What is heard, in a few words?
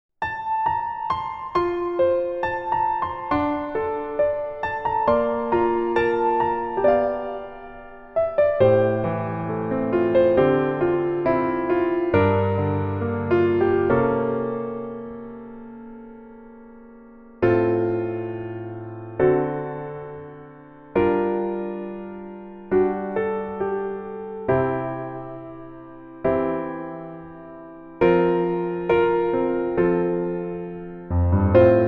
Piano ver